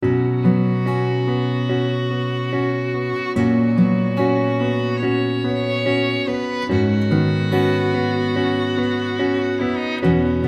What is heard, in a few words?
pizzica song